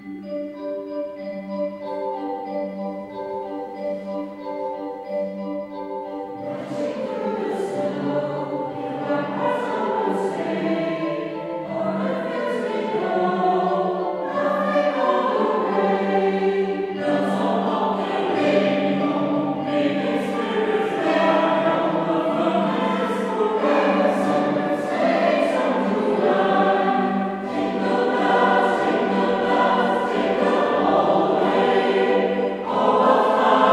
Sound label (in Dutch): Jingle Bells, Koor